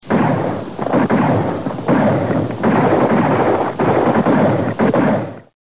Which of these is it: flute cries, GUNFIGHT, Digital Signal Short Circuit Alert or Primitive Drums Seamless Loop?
GUNFIGHT